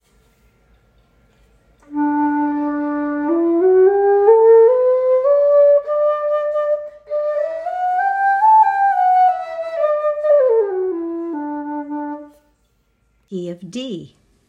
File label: Key of D, bamboo flutes